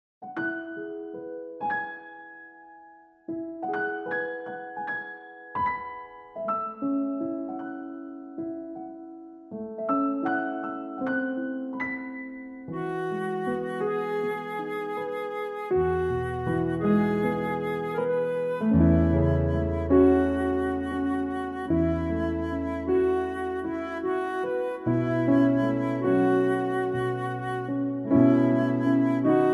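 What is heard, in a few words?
play reference flute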